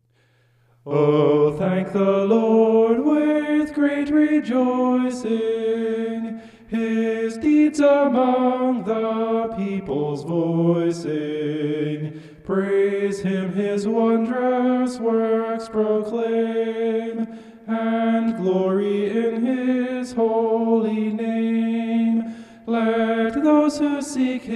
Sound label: vocal homoph; psalm 105